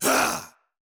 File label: Attack Vo; Antihero